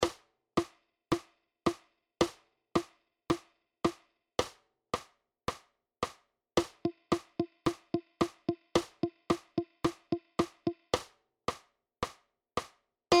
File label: 110 BPM